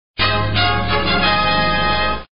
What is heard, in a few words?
game win; goldenflower